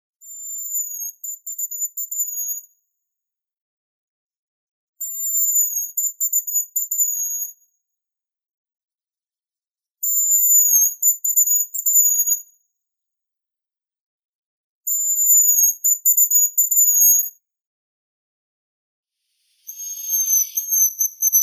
ryabchik bonasa bonasia, zvuki prirodi

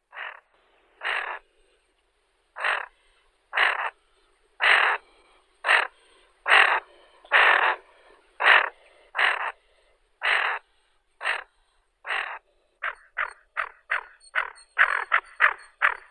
chernet morskaya aythya marila

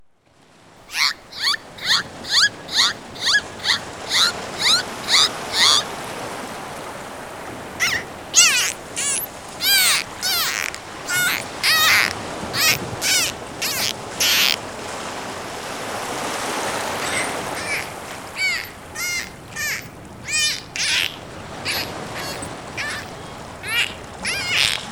prizivnie kriki delfina, zvuki prirodi

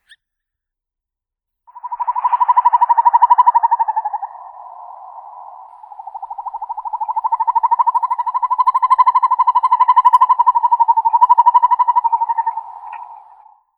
sova yastrebinaya surnia ulula, zvuki prirodi

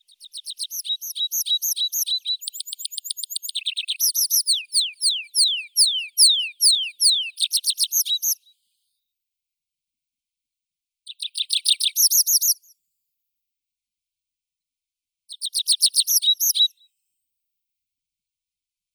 konek lesnoi anthus trivialis

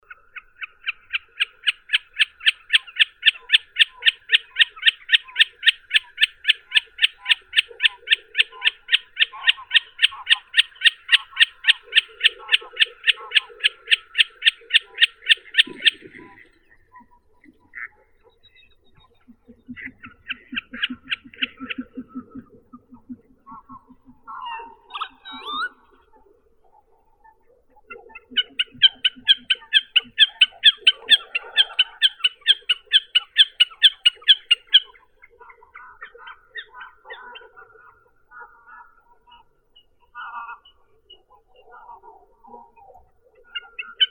bekas gallinago gallinago

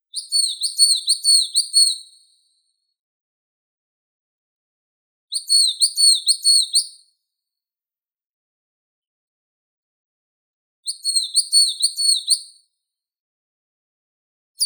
moskovka periparus ater

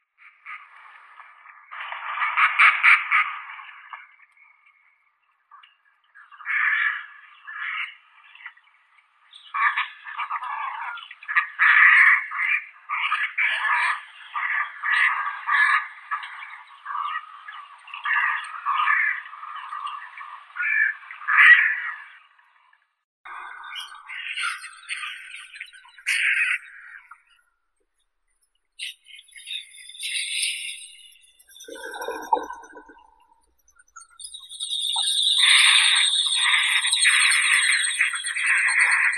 gaga malaya polysticta stelleri

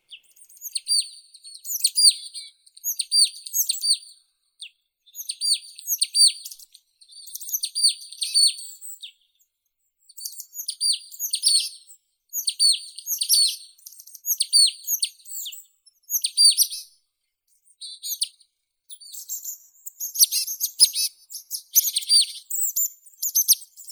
gaichka chernogolovaya poecile palustris

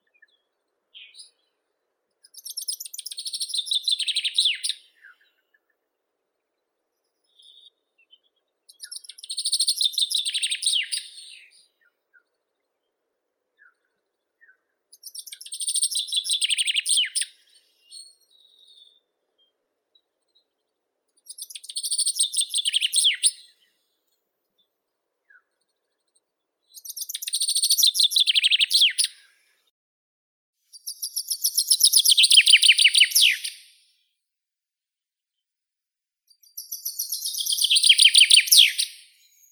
zyablik fringilla coelebs, zvuki prirodi

